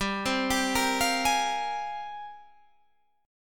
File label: G sharp Major 13th